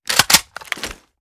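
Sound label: foley assault rifle akilo47 raise first end; weapons; viper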